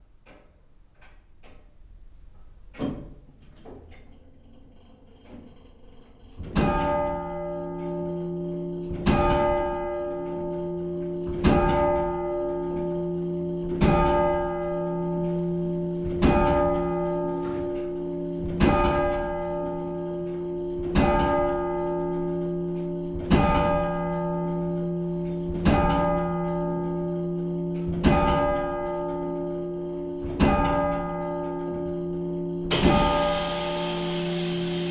Clock Mechanism at Midday St Andrews Church Heckington UK